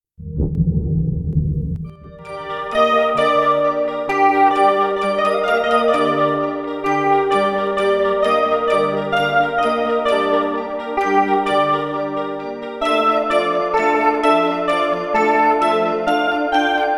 Music Box Guitar Cover; silent hill 2

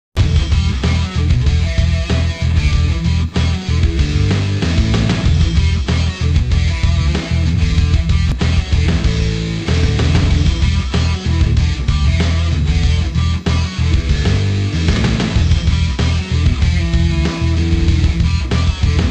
Menu Music; WWF Attitude

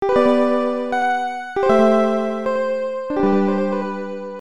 Sleep; Breath of Fire II

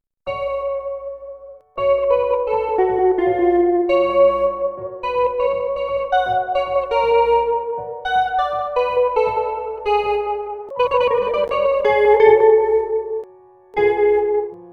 Guitar Cover; katawa shoujo

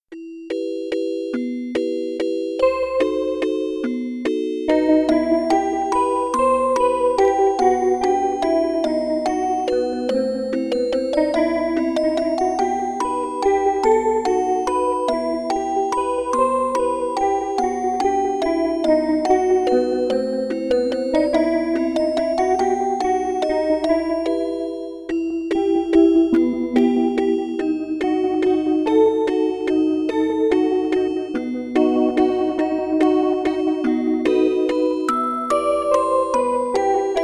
guitar cover

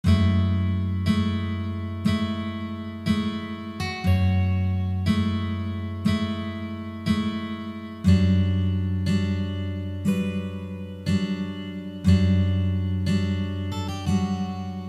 Piano Version; higurashi no naku koro ni kai

